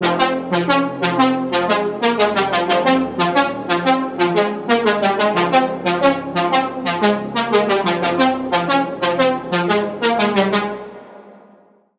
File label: Lead; Mafia Elite; Routed Sound; Spire Expansion - Noble Gangster Vol.1